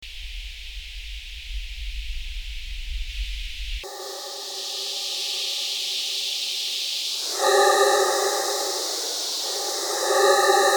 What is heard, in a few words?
filterbank, spectralis